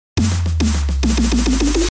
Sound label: Fill Psytrance